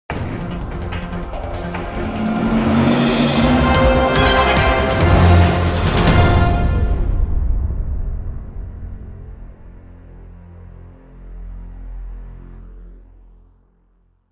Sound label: Commercial In; USA 2020; Millionaire Soundtrack; US Revival (2020)